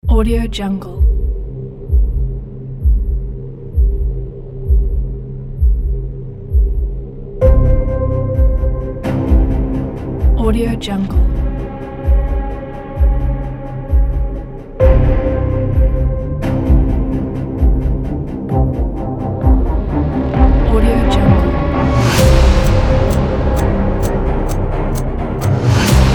blockbuster action trailer

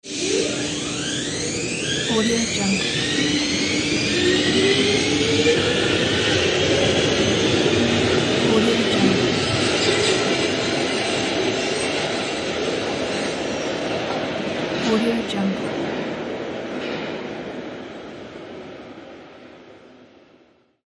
subway train leaving; nerso-77

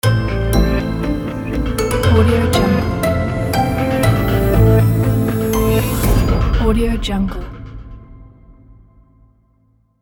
cinematic logo

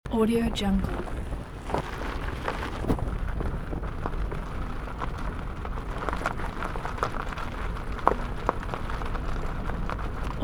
car driving slowly on dirt loop; fxprosound

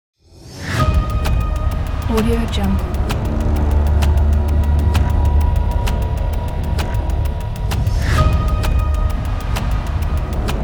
epic clock trailer

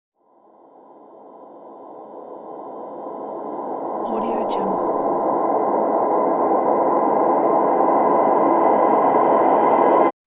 horror fx

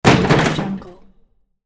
body fall and crunch on car

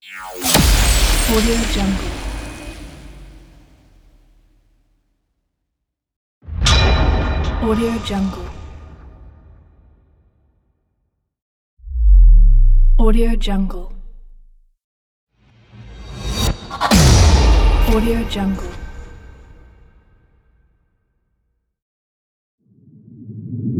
epic trailer transitions

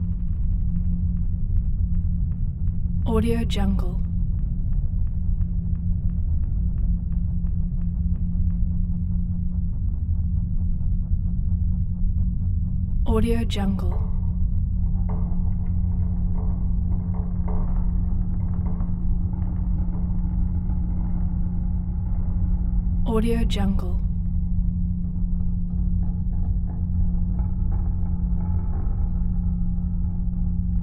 drones machinery mecanisms; doom drones 2; soundmorph

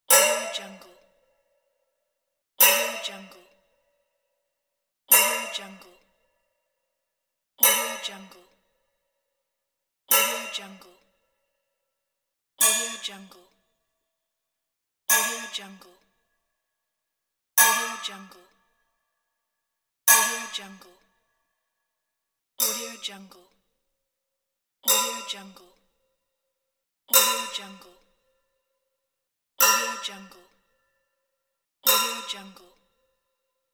silly triangle

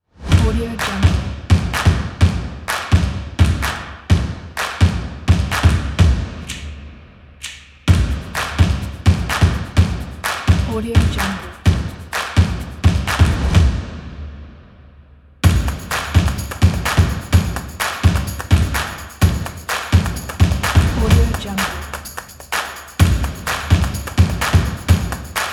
stomps claps and drums; sn-music

